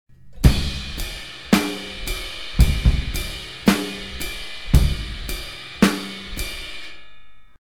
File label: Rock beat ride cymbal